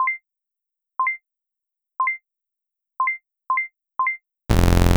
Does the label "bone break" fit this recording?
No